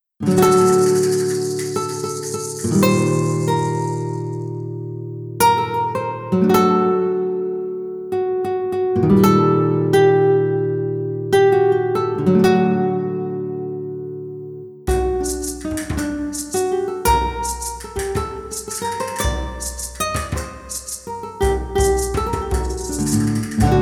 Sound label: Small Spanish Port; Pirates of the Burning Sea